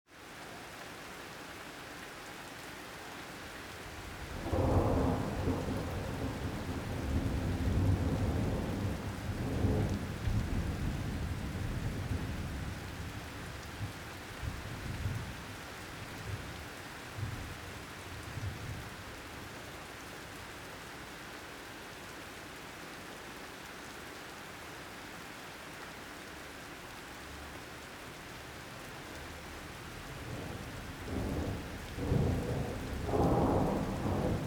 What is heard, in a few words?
peaceful shadows thunderstorm